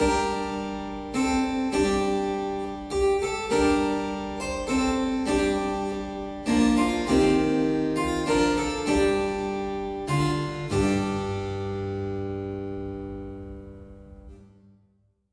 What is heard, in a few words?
BGM music start; Babylon's Fall